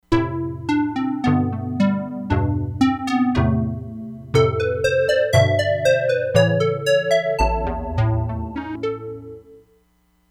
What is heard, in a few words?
HOLLOW, Crumar Bit 99; Factory Programs